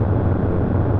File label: THRUST LP; lander